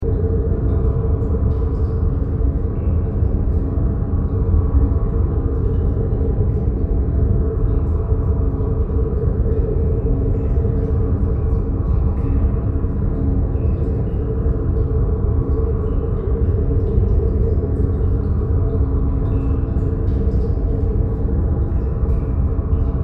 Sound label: sewer darkness stream